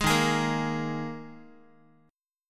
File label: Eb Suspended 4th Eb Guitar 4A 4E 5A 5D 5G sharp 6C sharp; Ebsus4; strummed